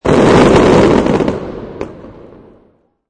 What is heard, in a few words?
Big Bang, Massive firework bang.; Firework Bang 1; Chinese New Year